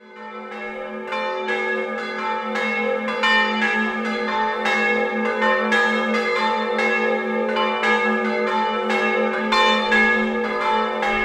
Orgnese, campane

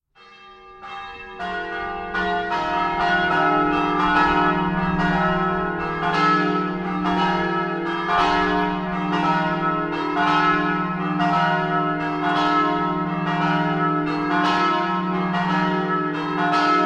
AZZIDA, campane

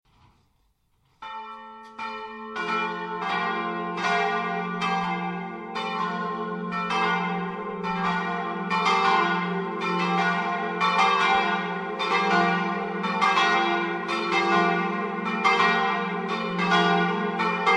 VALLE di Arta, campane

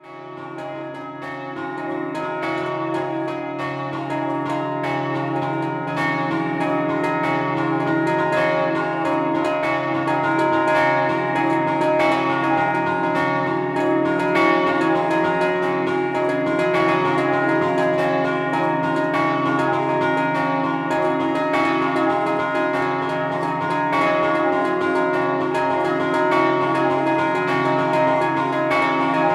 Mossa, campane

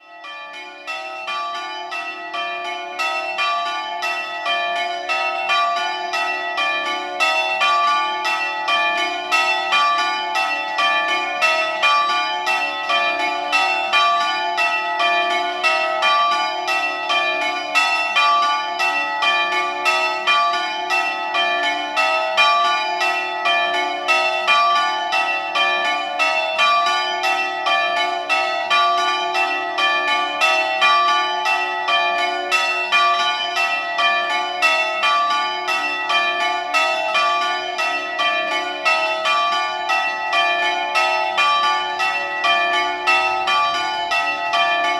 Sant Andrea, campane

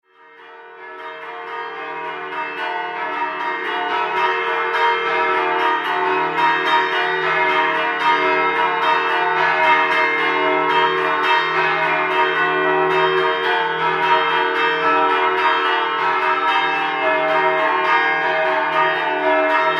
ILLEGIO, campane; SAN FLORIANO

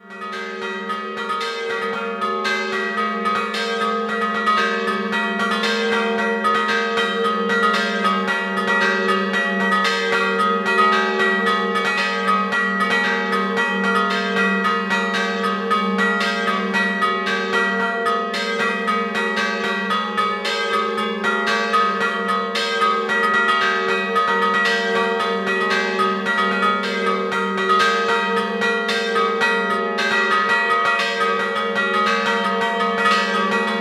San Vidotto Scampanottata, campane

